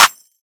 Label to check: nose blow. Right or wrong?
Wrong